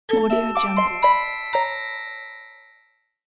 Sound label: bell pipe blow game over